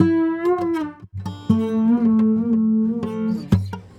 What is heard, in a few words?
Sarangi, Acoustic String; Sonic Foundry (Sony Creative Software); Friendly Fire